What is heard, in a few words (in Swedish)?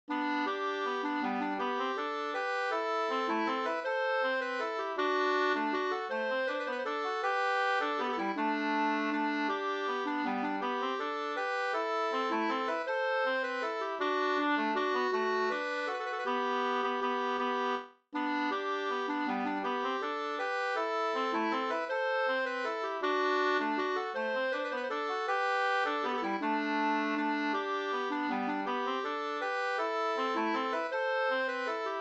vals Gdur